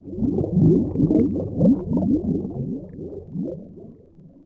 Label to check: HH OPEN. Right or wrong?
Wrong